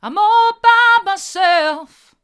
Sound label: hard, vocal